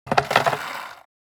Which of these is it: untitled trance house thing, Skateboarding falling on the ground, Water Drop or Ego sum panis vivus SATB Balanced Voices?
Skateboarding falling on the ground